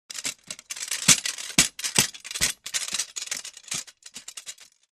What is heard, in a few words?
Sword Movement Sword Rattle & Thud With Light Bounce &; Hollywood Edge Foley Sound Library